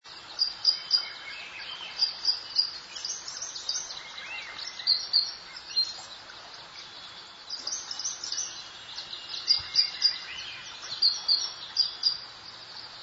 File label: country